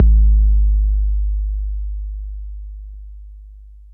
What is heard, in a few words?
BD 808 Deep